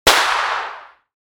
Pistol shot distant perspective

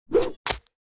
Throwing a knife short hit stabbing

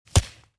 Bullet hit body impact

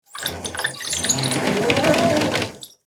Blind crank raise up squeaky rattle; blinds; gfxsounds

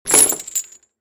Chain drop metallic impact soft

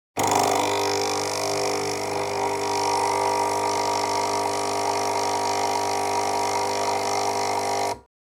Coffee machine operating noise; appliances

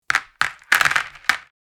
Board game map token throw; leisure

